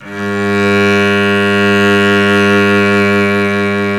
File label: SOL CEL 5 L, Synclavier Strings; ILIO